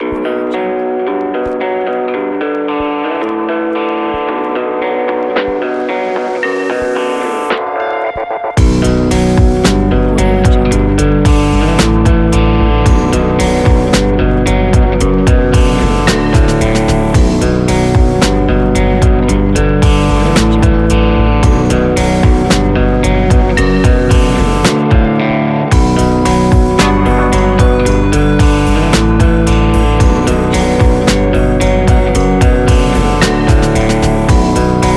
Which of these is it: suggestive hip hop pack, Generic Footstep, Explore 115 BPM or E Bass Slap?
suggestive hip hop pack